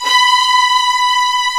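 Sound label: strings, Vlns 8 fff; Roland L-CD702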